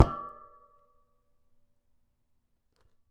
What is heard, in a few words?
Metal